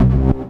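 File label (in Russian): кнопка цифровой низкий близкий мелодичный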